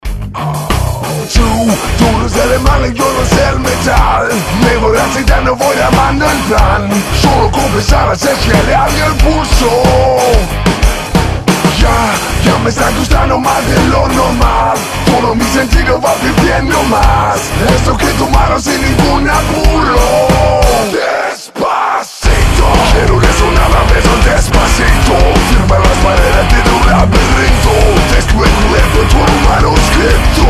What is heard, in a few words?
metal cover